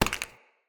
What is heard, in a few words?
Acorn Pickup